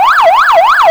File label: yelp, sirens; feniex storm pro